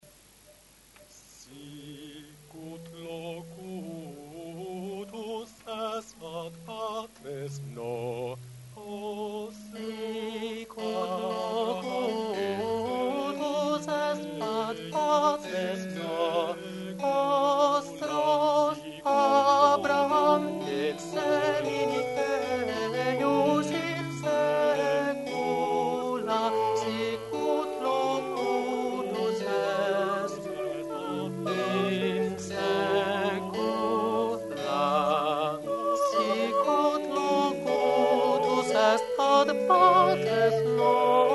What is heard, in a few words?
Magnificat; Bach